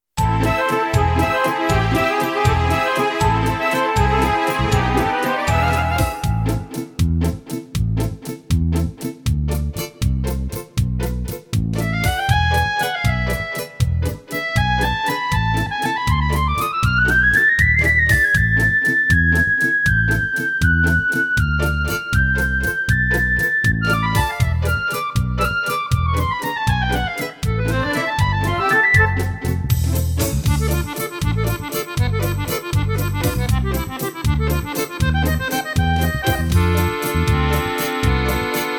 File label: brass minus